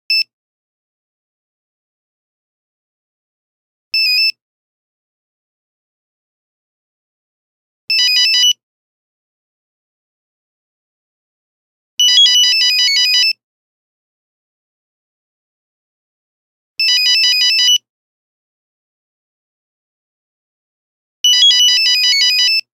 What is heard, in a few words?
Nokia Buzzer; Windows Phone apps